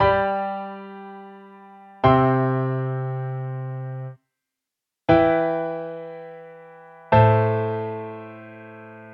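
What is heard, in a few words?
cadence authentic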